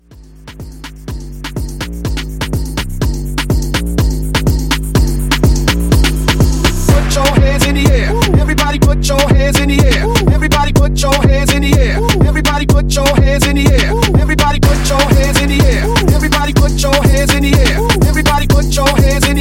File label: Hype Edit 124